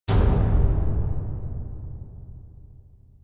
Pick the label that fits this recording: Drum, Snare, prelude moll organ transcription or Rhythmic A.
Drum